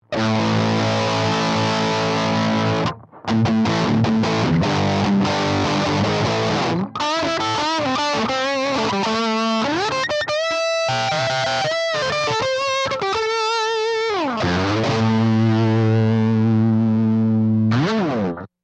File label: dimarzio dist; greco